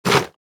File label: eat